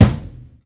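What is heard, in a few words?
sound of a tom in a closed room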